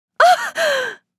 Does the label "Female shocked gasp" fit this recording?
Yes